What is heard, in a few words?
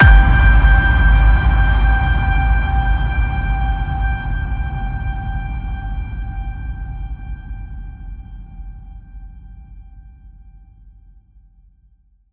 Ping Of Doom, G